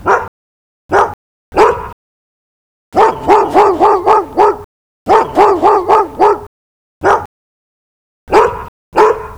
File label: black lab, barking dog